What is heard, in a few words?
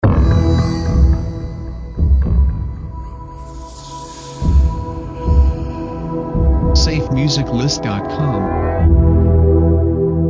Dark Horror Night Intro; SafeMusicList